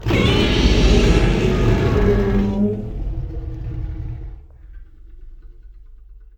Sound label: godzilla roar